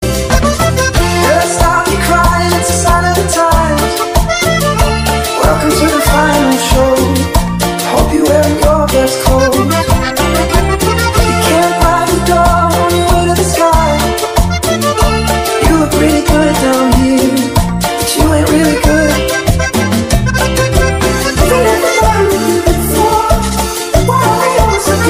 cumbia

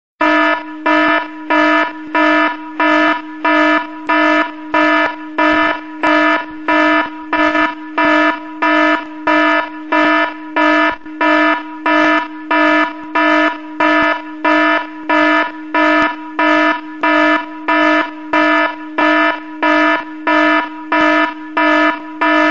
iphone alarm tone

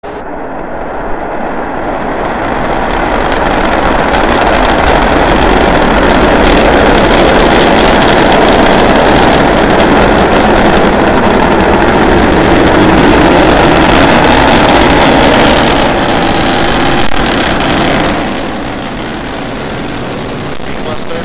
starship high altitude flight test

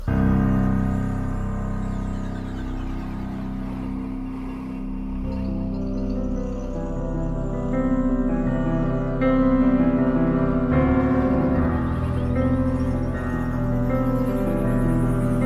piano

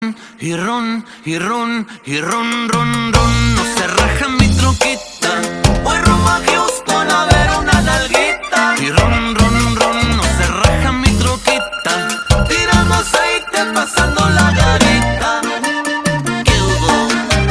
cumbia

